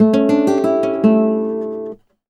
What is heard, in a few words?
Flamenco, Guitar, a